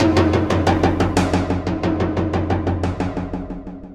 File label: 90 bpm beats; Torchlight III; PS4